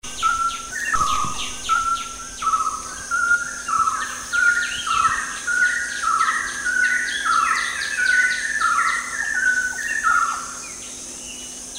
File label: chestnut-necklaced hill partridge; DANUM